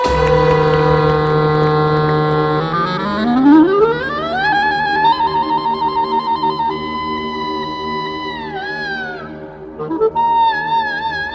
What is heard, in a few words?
Spooky Solo